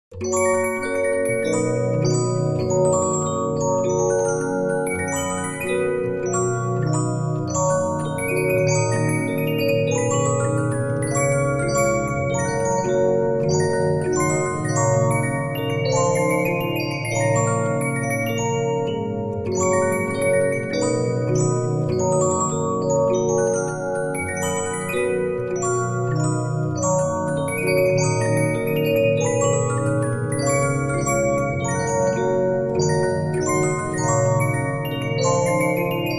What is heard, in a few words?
Regina, 15inch disc musicbox